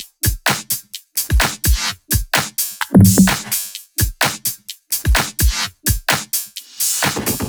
128BPM Kit; Vengeance Samples; Dumbflange Kit

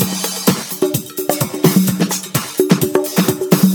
Housebeats, 128BPM; Loops; Vengeance Samples

